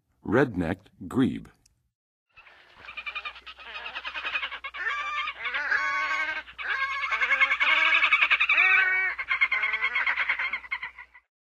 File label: Red-necked Grebe, Songs of Birds of North America; Western USA; Western USA Songbirds